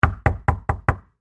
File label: Foley Door Knock Solid Door; Objects